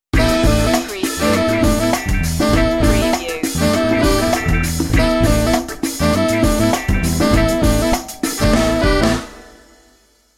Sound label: funky stinger